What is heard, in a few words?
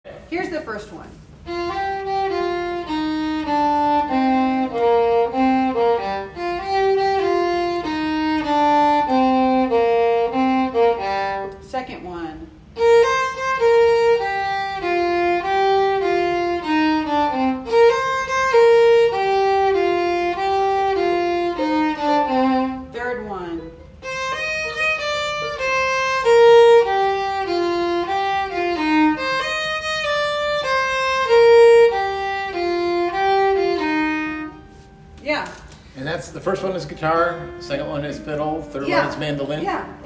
harmony Cm Guitar Fiddle Mandoliin; Last Moonshiner